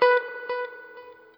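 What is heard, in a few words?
NOODLE SHORT, Guitar & Bass; Quantum Leap; 90's Sample CDs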